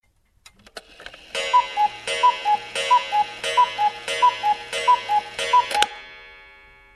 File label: funny sound